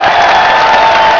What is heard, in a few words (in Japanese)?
kansei, cheer; Nintendo; July 2020 Gigaleak